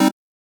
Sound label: Chord